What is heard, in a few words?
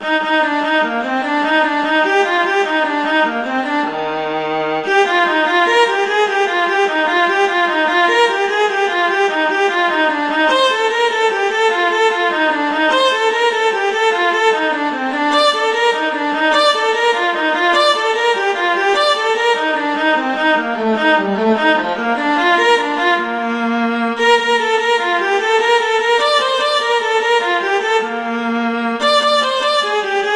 Gigue; Bach; Suite 4